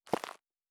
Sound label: Dirt Walk Surface, Footsteps; Survival Game Sounds - Lite Edition